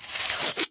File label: Bandage; Medical